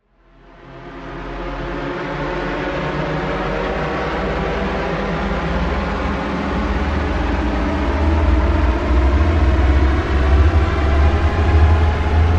Rise Swarm Of Locusts

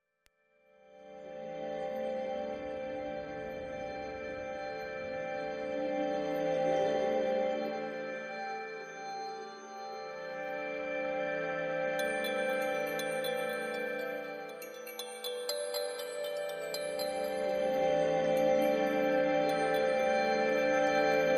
No Washy Synths

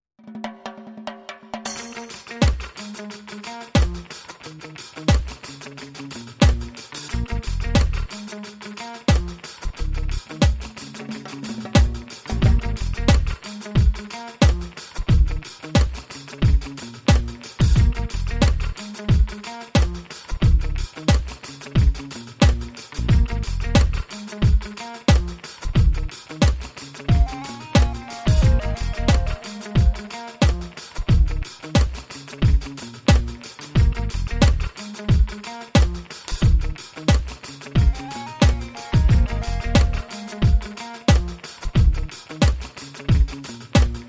Island Skank

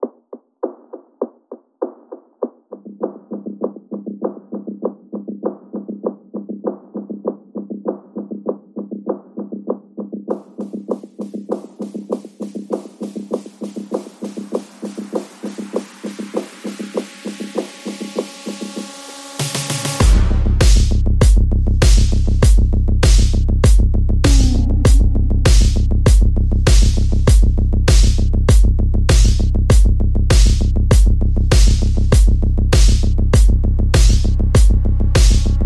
Drums Bass and SFX Only

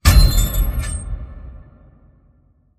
Afterlife Sharp Flash Hit

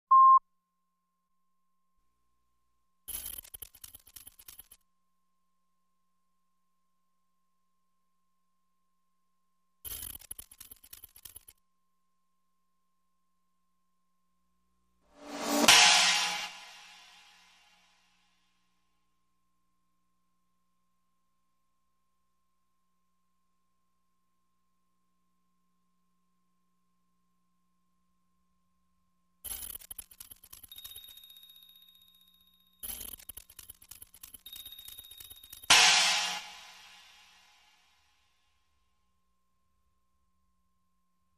Percussion & SFX

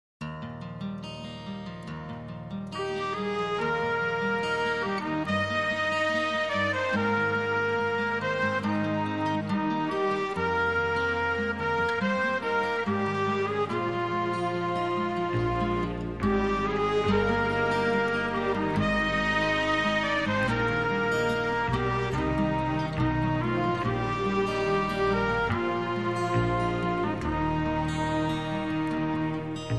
Acoustic

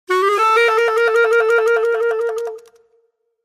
Clarinet Hmmm Let Me See

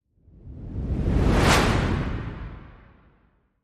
Flyby

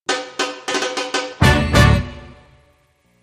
Hit

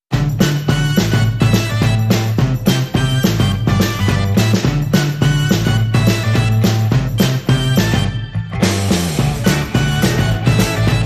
No Vocals No Guitar Licks

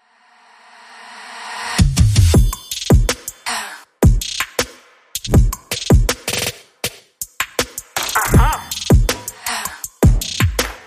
No Bass No Brass

